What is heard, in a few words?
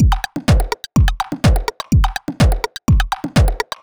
New House, House Drumloops 125bpm; Zero-G House Fabrik; 90 s Sample CDs